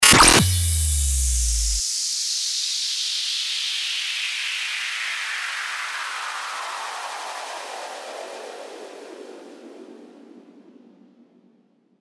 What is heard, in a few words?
STARTER WHOOSH